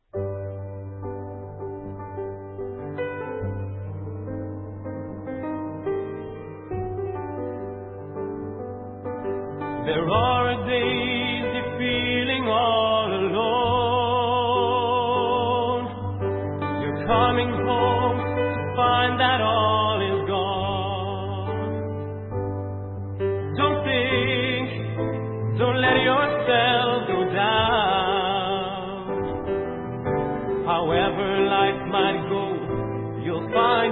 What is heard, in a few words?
men cry, mannenkoor